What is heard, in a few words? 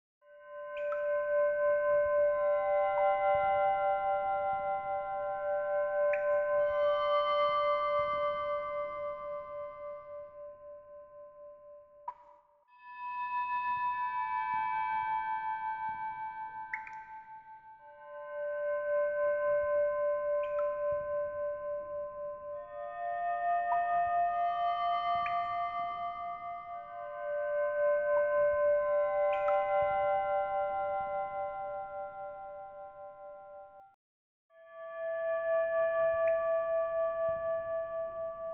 Ambient City Tombs (Krazoa Portal Ambience II); Star Fox Adventures